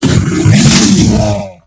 bear attack turn; npc

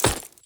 armor down; skyrim

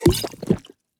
potion use; skyrim

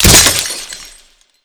icicle impact break; frost; skyrim

